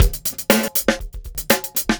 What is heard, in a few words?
Funky Beat, Drums